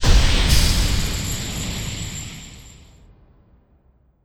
coccoon burst open; gamerip; Hollow Knight

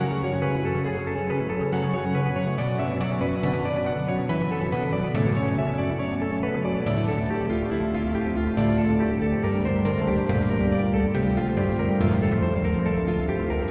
short loops

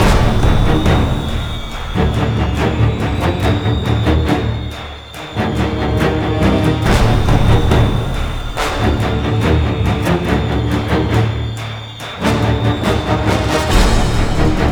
Suspense

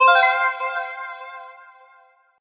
Clangy Sequence; Golf Blitz 1.12

